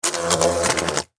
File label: Wasp Attack, Wasp, Attack, Fly, Wings, Buzz, Insect, Bug; Wasps; Blastwave; Animals:Insects